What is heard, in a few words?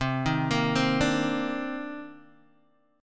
C Minor 9th C Guitar DADGAD